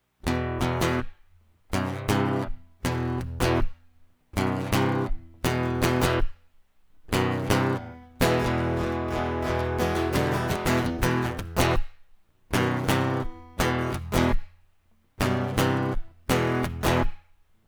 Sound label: Guitar Loop